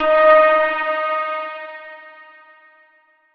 chime; chime sharp

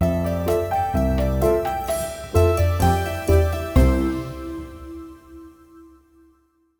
Ice Cream Success; Wobbly Life

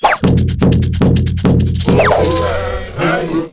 Character Attack

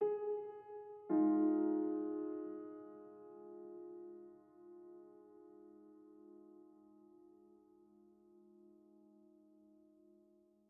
Env Field Day Ground

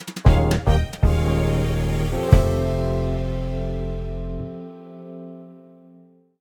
level won

